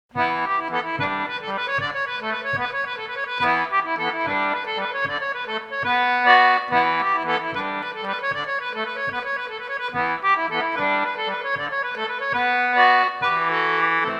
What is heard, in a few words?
bourree, concertina; A French bourree